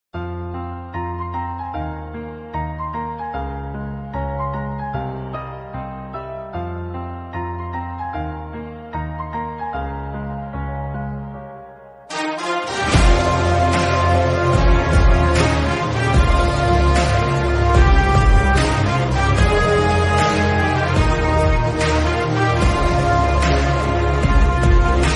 south mass ringtone instrumental attitude action remix; kalki x kgf